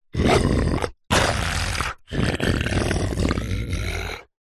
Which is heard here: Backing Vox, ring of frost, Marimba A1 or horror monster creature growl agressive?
horror monster creature growl agressive